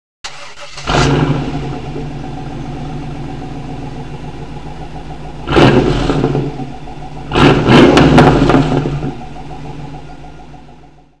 startup

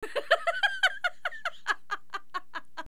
LAUGH

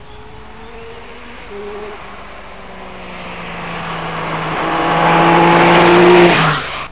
bike sound

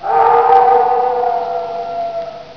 howl

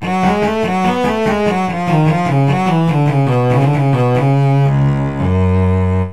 cello; AWE64

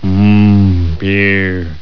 beer

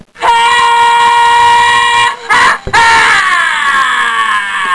haaha

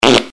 fart

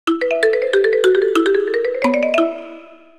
marimba; AWE64

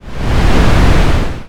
swng noiz

